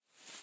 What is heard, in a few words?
Footstep Foliage Grass Rev